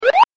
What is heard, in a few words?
stomp